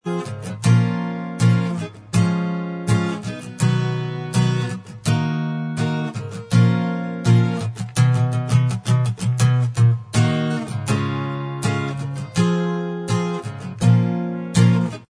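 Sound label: acoustic instrumental Track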